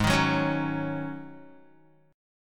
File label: G sharp 7th Suspended 4th G sharp, Guitar; G#7sus4 strummed; JGuitar